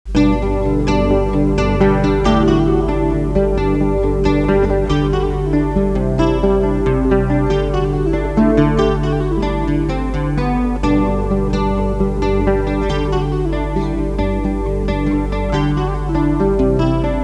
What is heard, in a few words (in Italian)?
musica strumentale